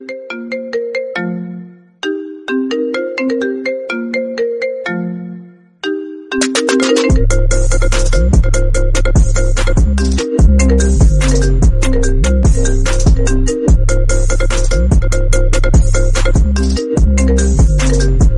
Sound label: i phone ringtone remix